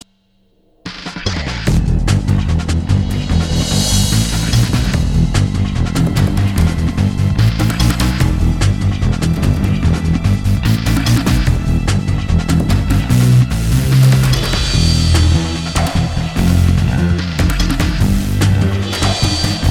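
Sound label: Battle E minor 147 bpm; Disney Infinity 2.0 Marvel Super Heroes; Arachna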